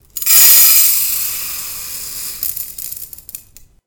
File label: rice